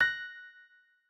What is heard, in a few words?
Finish Success; oxygen sounds; plasma